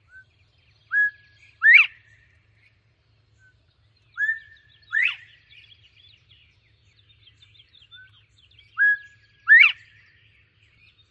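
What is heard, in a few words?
Northern Bobwhite, songs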